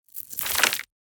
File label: Small stones and gravel; rocks